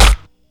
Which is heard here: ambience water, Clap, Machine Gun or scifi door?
Clap